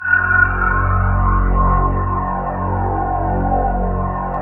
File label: SYN MORP, Roland